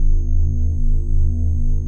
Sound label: Scifi Mechanical Arm Loop; SFX Kit; Sci-Fi Loops